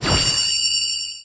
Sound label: Laser